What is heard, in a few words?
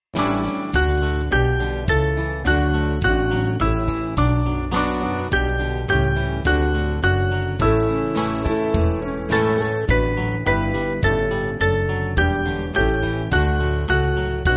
Band, church music; Out Of The Depths; St Martin